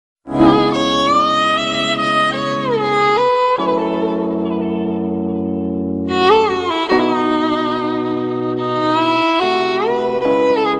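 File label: awesome sad tune ringtone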